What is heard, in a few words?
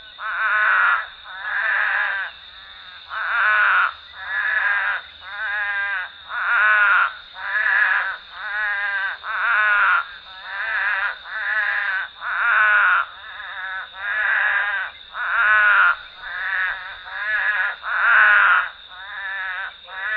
Scaph couchii stereo chorus, south central texas spadefoot toads; Couch's Spadefoot Chorus - Dimmit County; Dimmitt County, Texas